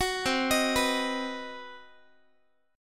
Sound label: C Minor Major 7th Flat 5th chord, Guitar; CmM7b5; arpeggiated